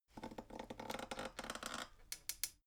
folding chair creak

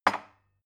glass put down cupboard hard

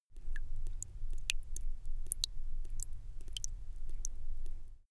drip

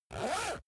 zipper use quick

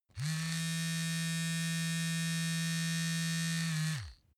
razor smooth

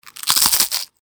eating chew crisp

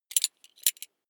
metal belt buckle clatter heavy

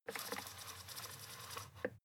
fader plastic stutter soft

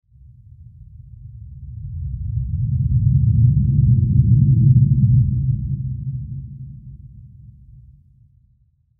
ultra low flanger

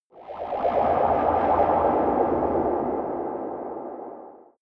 space alien spacecraft underwater big drive

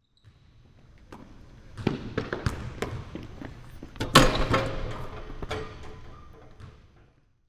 basketball run and throw

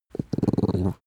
dog small growl very soft short

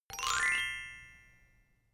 notification glockenspiel slide up soft

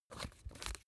razor battery compartment screw down

